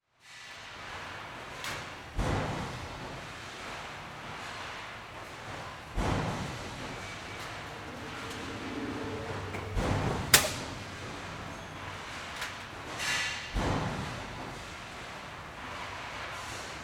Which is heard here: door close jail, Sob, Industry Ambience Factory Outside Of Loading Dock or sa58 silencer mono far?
Industry Ambience Factory Outside Of Loading Dock